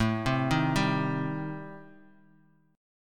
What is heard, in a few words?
A-Minor 7th Flat 5th, Bass Standard, chord; 17,15,13,12; Am7b5 arpeggiated; JGuitar